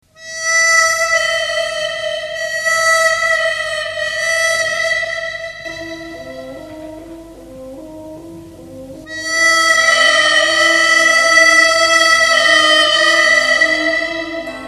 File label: harmonica